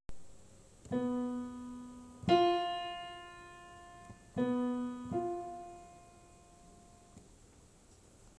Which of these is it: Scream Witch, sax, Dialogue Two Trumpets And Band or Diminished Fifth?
Diminished Fifth